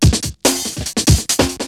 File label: BREAKBEATS, TECHNO